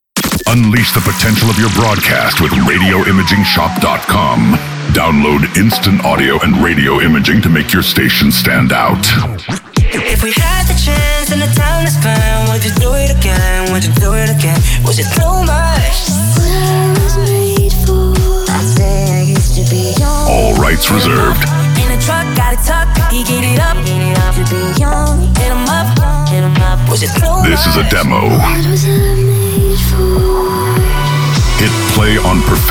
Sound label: Radiojingle CHR Beatmix